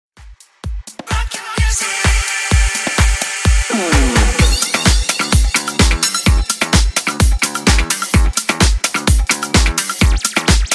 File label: Electronic House 128 Bpm